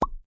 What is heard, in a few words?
bubble land